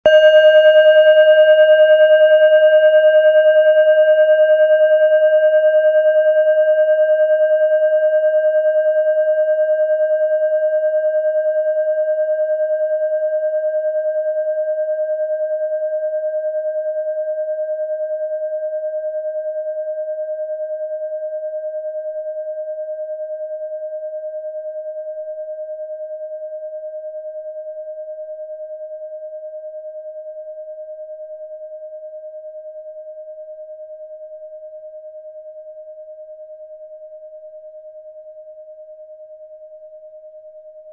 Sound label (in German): klangschale bronze